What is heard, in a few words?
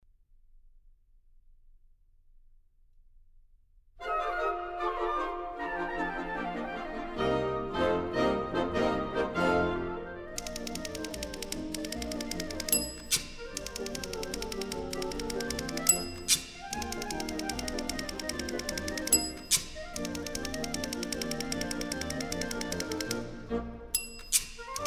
the typewriter band